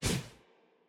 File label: Ui Screen Close; portal quest; android